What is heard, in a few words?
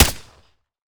fire sup, glock; Urban-Coalition; Decay; arccw ud